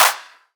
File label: drum clap wide; Virtual Riot; Drum Hits